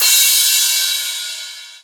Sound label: Crash, Cymbals; Vengeance Samples